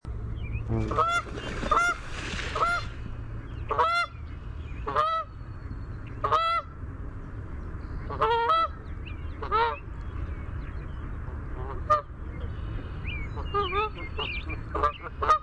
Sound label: canada goose; dave gammon